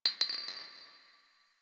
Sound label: Garbage Glass Drop; Warframe; Ambience; Nineteen Ninety Nine; DE